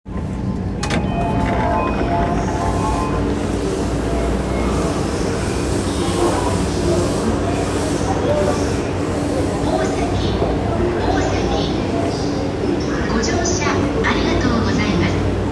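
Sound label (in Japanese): 山手線大崎 counterclockwise, train sounds; JR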